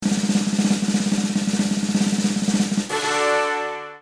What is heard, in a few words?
drum roll